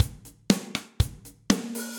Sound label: Kit Ambient Loud Punch, drums; Standard Kits; Ableton; Session Drums Studio